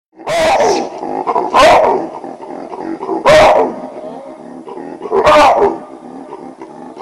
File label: baboon barking